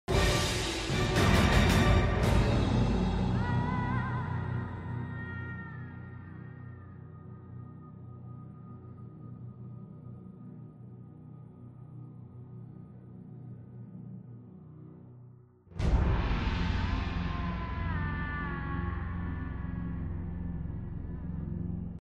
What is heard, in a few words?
fatality theme song; mortal kombat 11